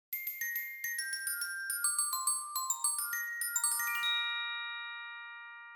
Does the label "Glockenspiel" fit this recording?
Yes